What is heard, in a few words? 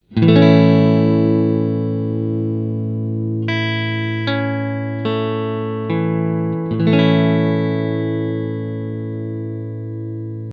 A Chord, guitar